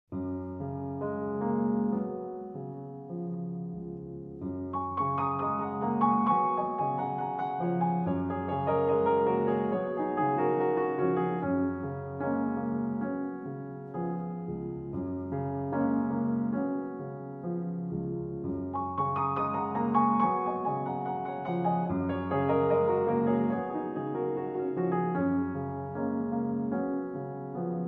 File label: Solo Piano